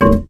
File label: wood plank impact soft